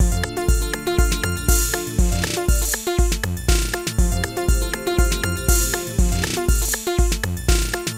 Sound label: Long Hallway - Electro